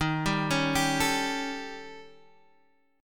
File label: D sharp 7th Flat 5th, D sharp, down, Guitar, Half Step Down